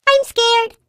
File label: turret launched